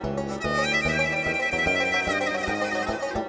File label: banj bass harp; The Interactive Alphabet